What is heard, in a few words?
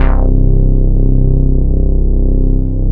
US TECKNO S, Bass Analog & Synth; USB Soundscan vol.12